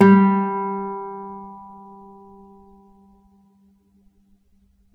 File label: s guitar; interpolator